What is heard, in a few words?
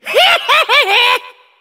laugh; hametsu